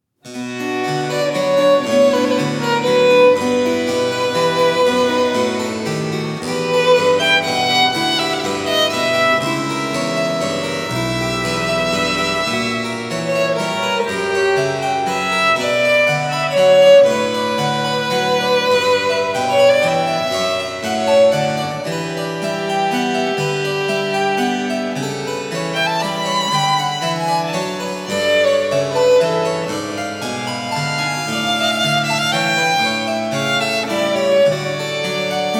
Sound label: Violin Sonata no 4 in C minor; Bach; BWV 1017